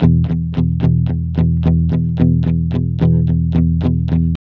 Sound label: ROCK E 110, Vintage Blues Guitar; USB Soundscan vol.22; AKAI